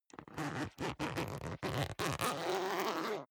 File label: zipper down jacket open unstable; objekte; reissverschluss